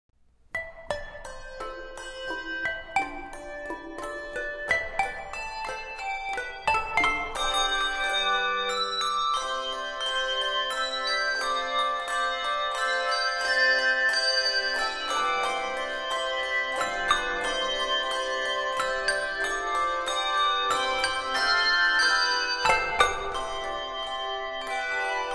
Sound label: Come Thou Fount Of Every Blessing (Handbell Choir); Pillsbury Baptist Bible College